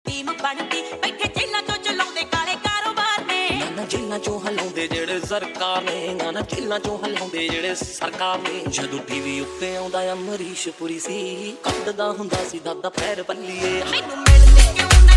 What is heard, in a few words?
Dhol Remix Song